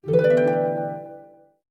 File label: relic reveal; Recode; jewel Quest Riches Desktop